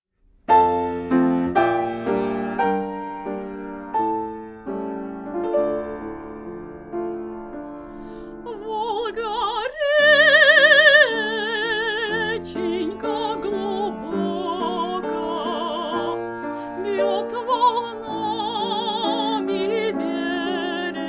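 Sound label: domra, Neumann TLM170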